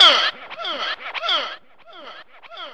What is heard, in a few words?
VOX.S-L; E-Lab; X Static Goldmine 2; akai